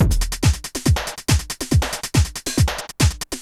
techno 140

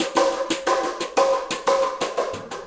ZERO GRAVITY BEATS

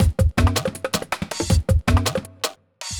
jungle juice 160

